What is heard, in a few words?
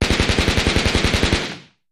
Gunshots Machine; fires